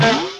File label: bonk and cymbal